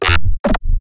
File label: glitch stab; Virtual Riot; Glitch Stabs